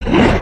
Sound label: Run, creatures organic